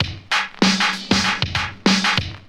hoo haa, Drum loops, Electro

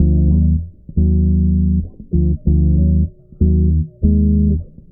bass, Loops Phonky

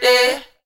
ay SUNG

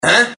heh male, Voice; ooh ahh; heh huh

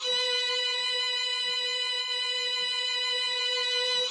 screeching, String; Notes

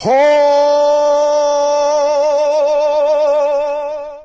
hey SUNG

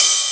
Hats Electro Open

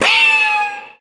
loudy, Zaps and Beeps

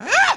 "ah"

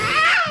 ow; Voice

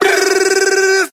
brrrrrr; Voice; ooh ahh

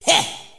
heh, Voice; ooh ahh

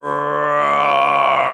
growl, Voice

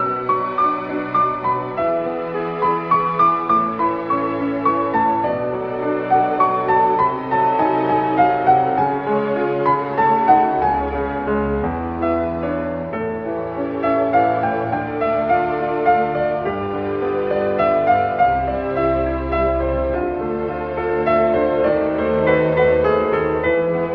piano, Loops, Sad

